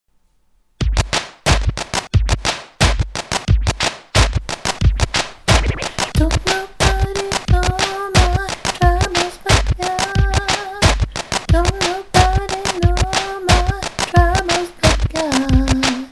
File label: SOPRANO